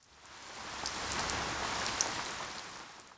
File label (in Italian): Acqua, natura